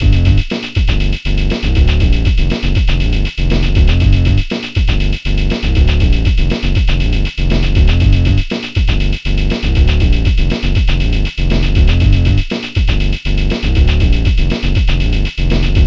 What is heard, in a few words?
Hard Bassline Groove